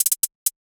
hat, drum sample; Hats

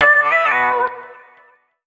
phrase Bm, Vocal; Slate Digital Omnivox Vocal Sample Pack

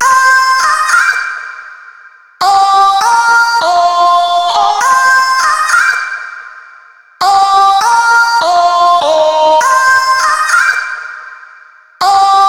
vocal chop, 100, Cm; Timba; Slate Digital Omnivox Vocal Sample Pack; Chops

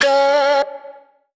Vocal one shot Db; Slate Digital Omnivox Vocal Sample Pack

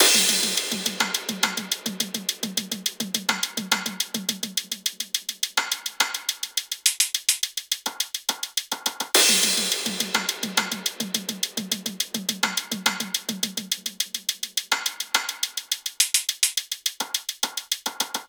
perc, Drum Loops, Top Loops, 105; SD MIDNIGHT Sample Pack; Slate

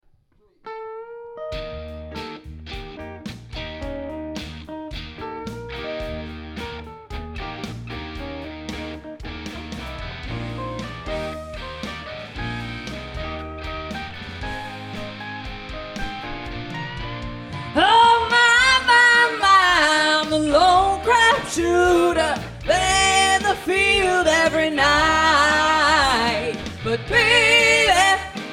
vocals

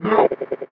vocal A; Slate; AURORA Sample Pack; Vocals

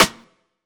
snare oneshot; Pop Sample Pack; Slate; SD PASADENA Pop Sample Pack

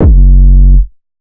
808 one shot F#; Wham; Slate Digital BASSHOLE Sample Pack

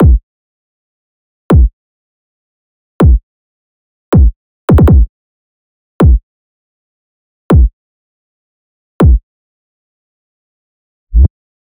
kick 160, Kick Loops; drum sample pack; Ebeko; SEISMIC; Slate

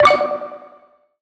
tonal one shot D; Pop; Slate; PASADENA Pop Sample Pack; Tonal One Shots

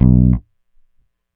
Bass B, Electric Bass One Shots; Slate Digital BASSHOLE Sample Pack

